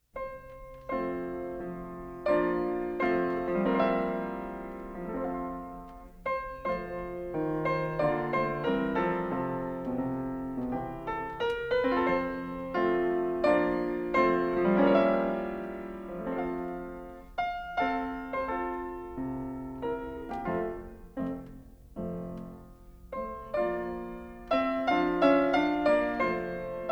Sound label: Six Variations in F Major for Piano, Op. 34 Tema. Adagio; Beethoven